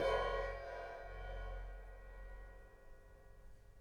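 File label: ride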